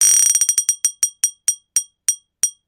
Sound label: bottle hit ringtone